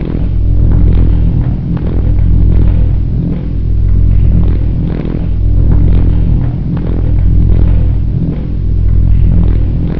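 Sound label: core ambience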